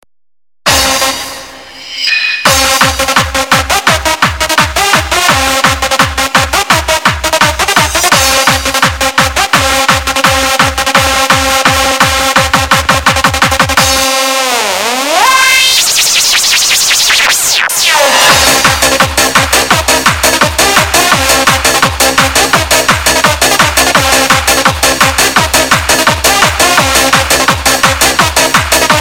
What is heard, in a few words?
Techno Shuffle